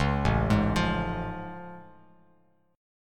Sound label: A Augmented Major 7th A Bass Standard; arpeggiated; JGuitar